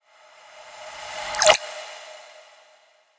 water swell; soundscrate

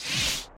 lightsword turning off; soundscrate

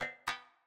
graphics robotic tick

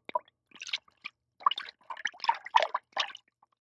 water splash

